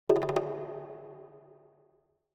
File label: Jungle Drums In The Middle Of The Jungle - Slow Down Thin Hits - Short; Hollywood Edge; sound designer's toolkit v2